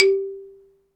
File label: XYLOPHONE, Percussion; ILIO